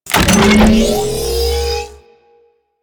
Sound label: Extending Bridge Activate; Warframe; Ambience; Corpus Gas City; Gameplay Remaster